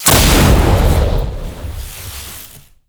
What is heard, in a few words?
skill burst